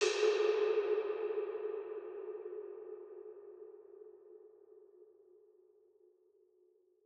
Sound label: Tonal Impact (Decay) - G#; FX